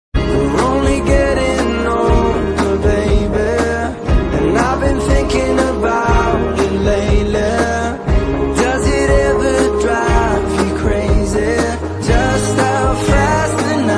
romantic ringtone beautiful ringtone english ringtone